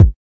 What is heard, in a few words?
kick